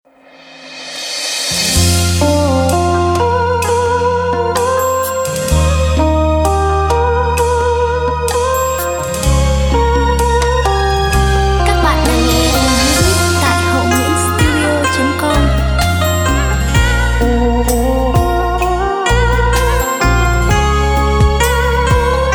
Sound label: beat, playback